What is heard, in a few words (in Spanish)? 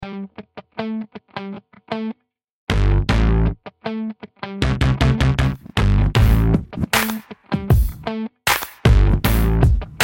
Base musical RAP